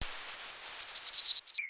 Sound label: SPACE FX 140; SpaceSounds